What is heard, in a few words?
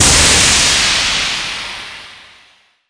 steam; Earth and Beyond; EA Games